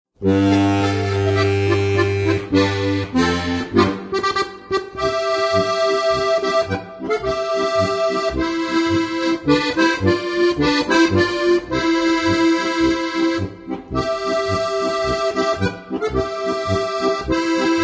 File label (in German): Wachtel-Polka